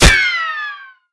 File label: ric, weapons